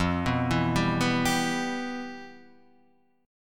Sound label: G 6th F; G6/F arpeggiated